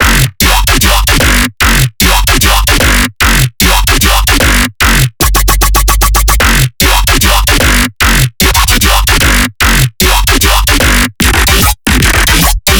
Basses 150 F#; knights; Gawain; Chesticle

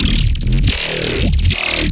glitch

